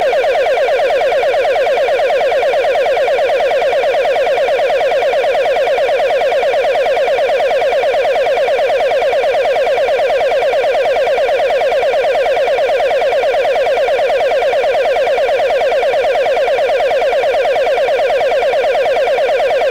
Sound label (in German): ddr raumton